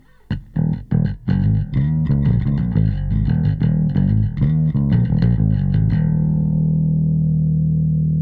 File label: Xtressor bass